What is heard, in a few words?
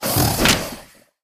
win croc; jumanji